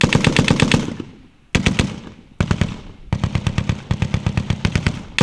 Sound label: PRC RAT-A, Guns Tuned; Roland - Rhythm Section; Guns & Glass